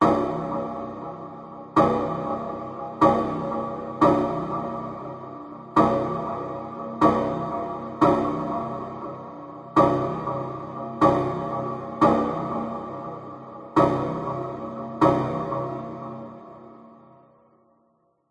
sound design cinematic dark tension rhythm stabs synth 120 loop; zapsplat